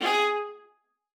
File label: VIOLA SPICC G#4; Xsample VOL-5